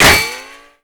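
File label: bullet impact paint metal; Modern Warfare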